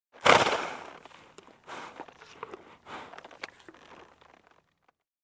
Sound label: Horse Neigh with breathing